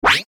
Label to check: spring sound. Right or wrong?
Right